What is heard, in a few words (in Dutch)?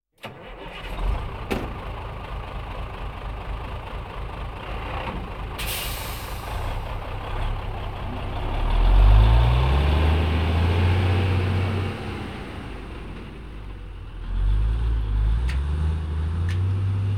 Vrachtwagen - Start & rijdt weg; KMP Film Video Sound Effects